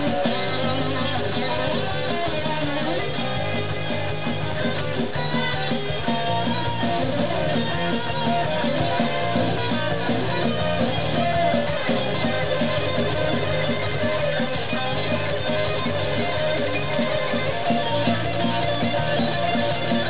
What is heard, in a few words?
solo